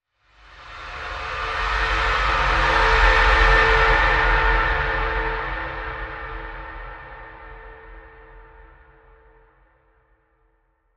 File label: Shielding Bright Transition